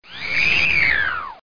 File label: goggles